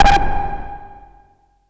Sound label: fx siren sentry